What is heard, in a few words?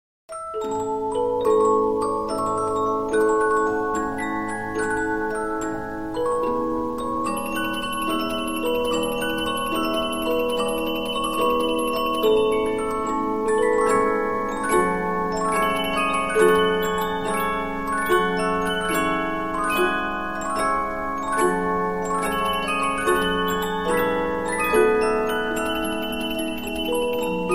Polyphon, 15inch disc musicbox